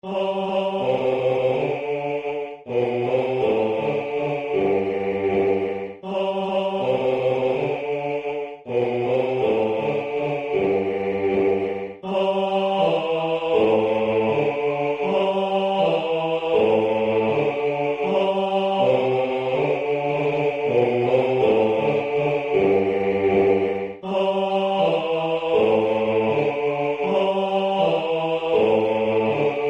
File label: BAS; Din Don Merrilly